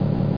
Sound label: engine, tank